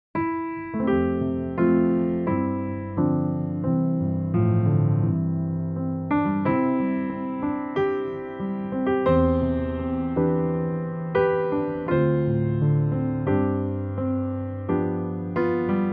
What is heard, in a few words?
Backing, playbacks; primeiros passos